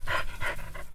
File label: e sniff; CosmicRage